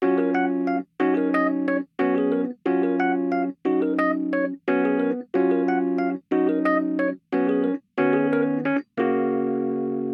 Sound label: Positive Attitude, ringtones; Samsung Galaxy E5